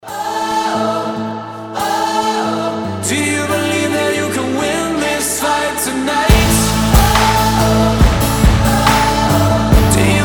acoustic

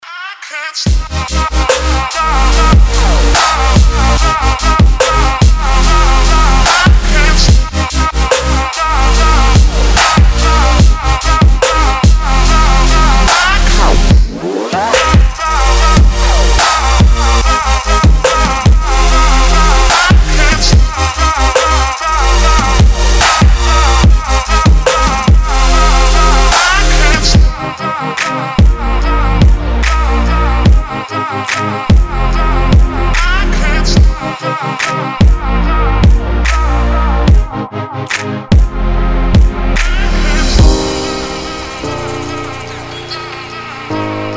dubstep mix

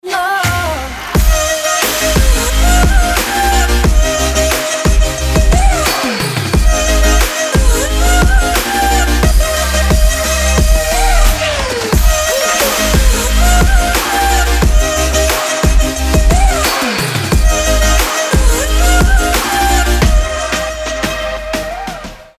Future Bass Remix

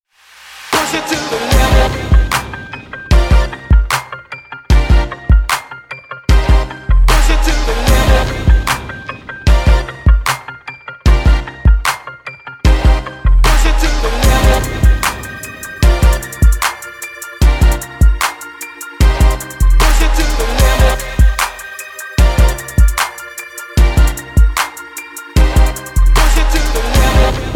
instrumental

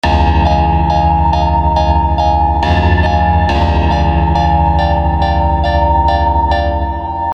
horror

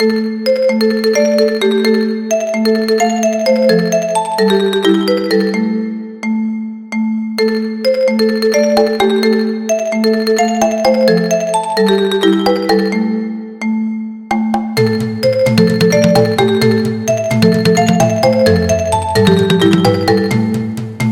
marimba

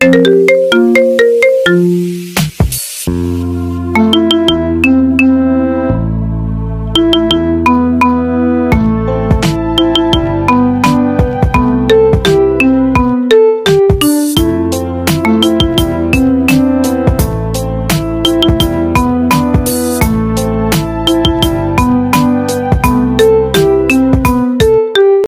iphone remix

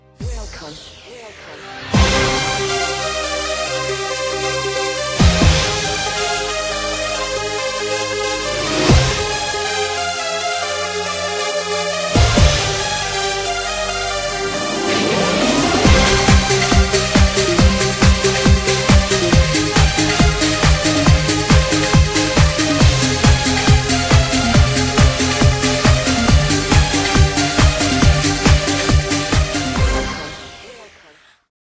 cool disco ringtone

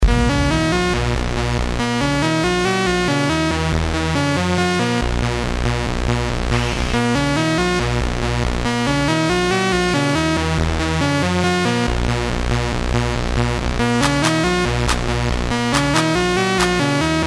electro house

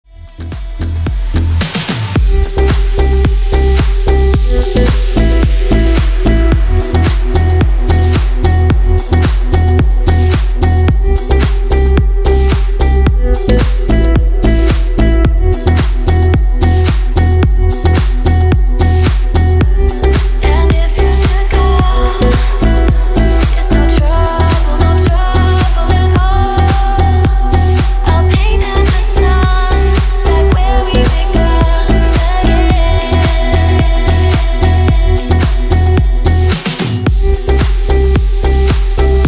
sexy single indie dance

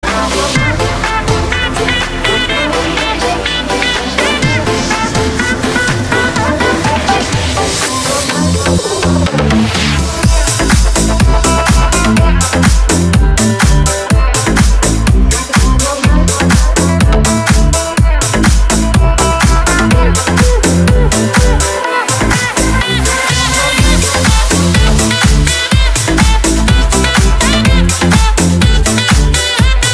Sax Radio Remix

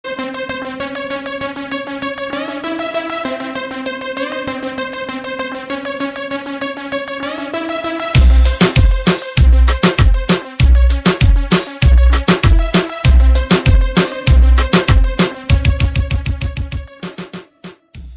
instrumental

